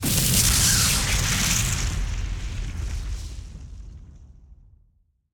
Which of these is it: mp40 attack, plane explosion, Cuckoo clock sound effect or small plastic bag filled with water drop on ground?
plane explosion